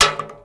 metal; Mafie 1

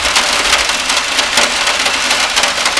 metal; Mafie 1

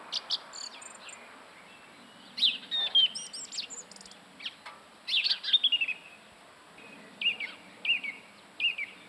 bird; Mafie 1

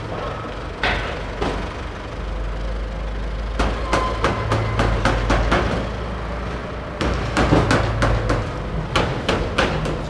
build; Mafie 1

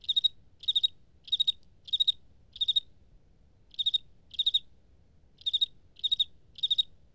vermin; Mafie 1

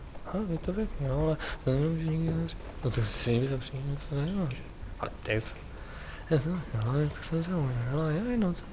whimper; Mafie 1

